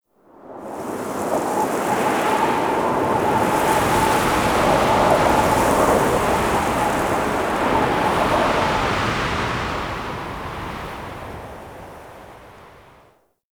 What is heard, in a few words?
Exterior Wind Gust; Ambience; Warframe; Duviri; Murmur